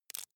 wood chip small break creak soft

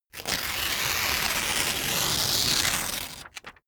sheet clean tear up slow

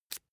match light fail

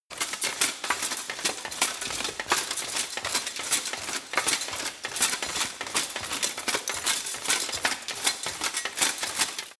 run

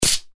compressed air

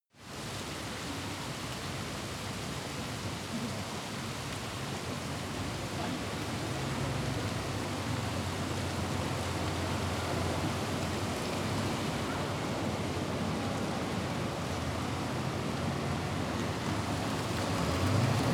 rain marketplace traffic tram

